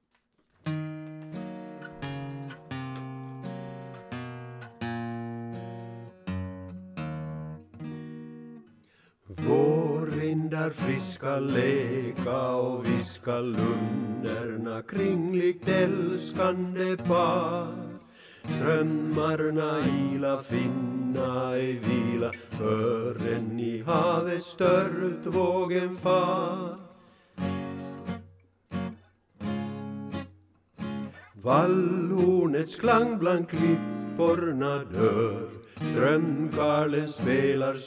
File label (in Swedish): tenor